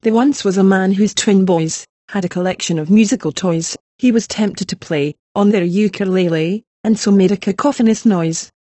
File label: ukulele; limericks